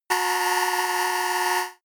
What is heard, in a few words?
glitch electronics short, sciene fiction